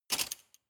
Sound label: cutlery large drawer grab hard